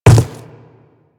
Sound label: Quadruped Medium Walk Dirt, footsteps